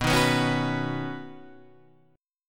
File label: B Major Add 9th, B, down, Guitar Standard; strummed; JGuitar